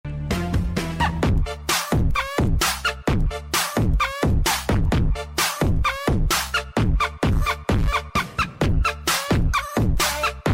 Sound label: beat